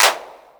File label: Clap Wet; One Shots; Drums; Fenk; Ableton Live 12 Lite; Core Library